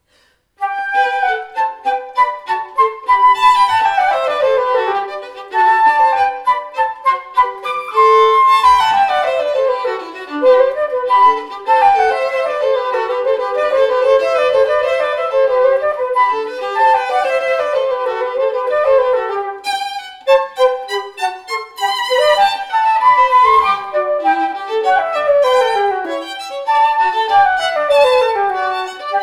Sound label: flute duet; Beethoven